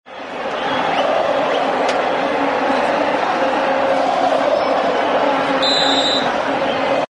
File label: sports soccer cheering and singing